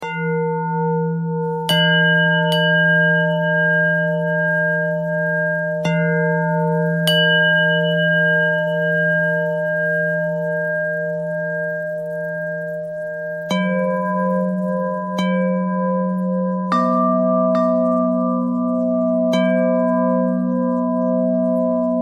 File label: bell bell thread noise